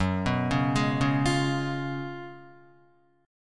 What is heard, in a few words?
Gb Major 7th Suspended 2nd Suspended 4th, Guitar, GbM7sus2sus4 chord; GbM7sus2sus4 arpeggiated; JGuitar